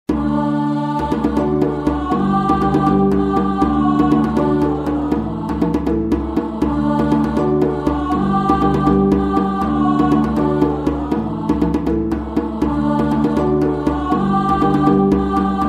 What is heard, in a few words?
Alto